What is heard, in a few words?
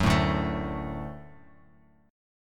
D Major D down Bass Standard; D strummed; JGuitar